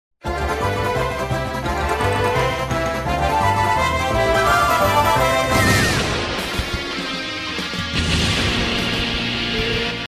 stage clear; cave

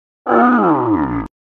whomps

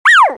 woo

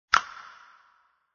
switch

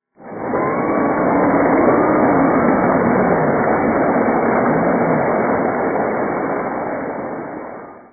crowd applause; vapesquared

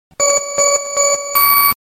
race start

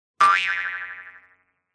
spring

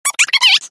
clock

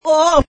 bowl, reverse

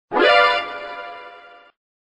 alert

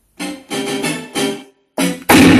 jingle

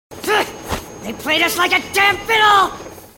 fiddle

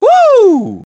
woo

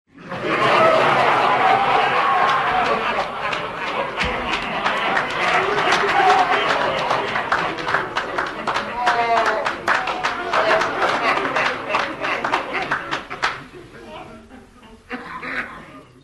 haha; reversevape

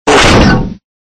hit

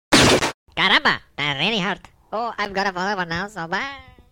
gun

